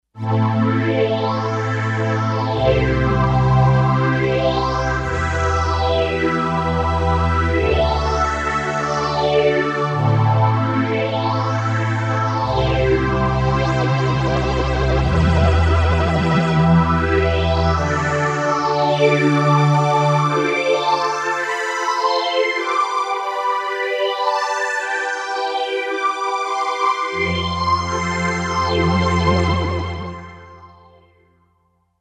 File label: PhazStrg, Kawai K5000S